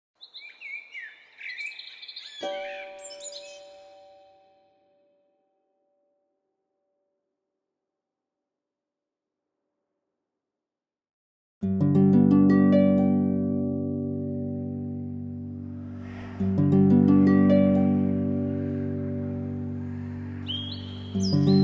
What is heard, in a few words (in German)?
Erwachsener Mann, Classic Harp G Major C Minor D Minor